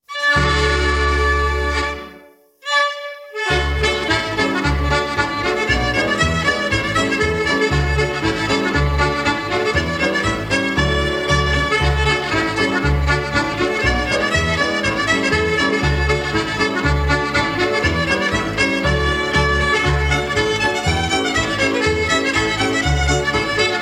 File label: Scottish Country Quartet, Medley